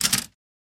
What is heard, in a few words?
Typewriter Manual; typewriters